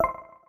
Stop Watch Finish; LeEco Le 2

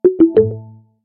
traffic report tah lah lah; Samsung Galaxy S10; Maps

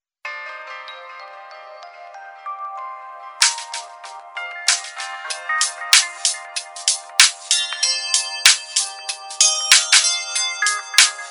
Fairy Palaces, Ring Tone; LG; GX500

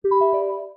Mail; Elephone U Pro

